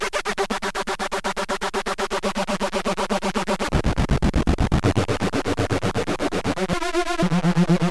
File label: F pulse 120